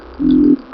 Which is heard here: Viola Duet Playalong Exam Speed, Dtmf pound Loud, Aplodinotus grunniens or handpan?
Aplodinotus grunniens